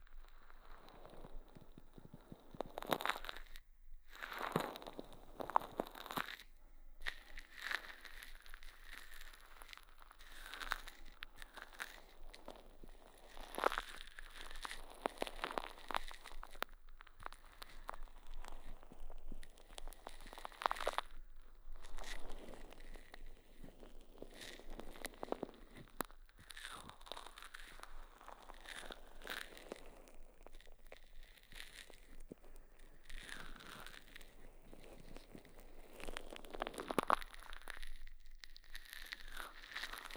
W濡れ耳かき（クリーム指耳かき）, ASMR

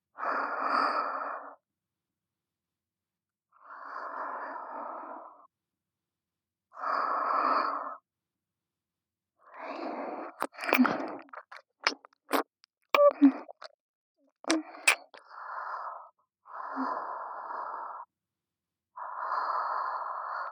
眠りながら右耳舐め; ループ; SEあり

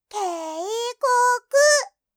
メッセージ（警告）

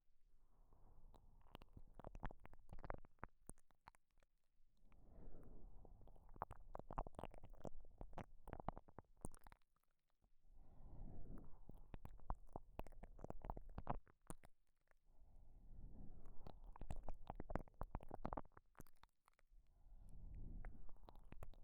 KU100 右耳 優しく

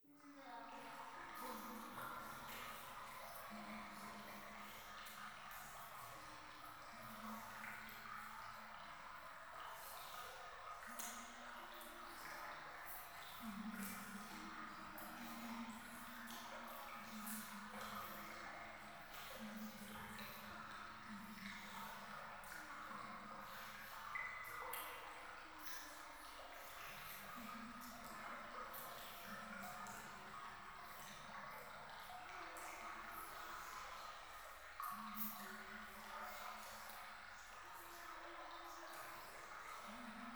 教会 フェラチオ 川のせせらぎ

